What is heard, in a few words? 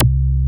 MOOG C2, ANALOG BASS; Music Store - Claudius Diemeter Schwerlos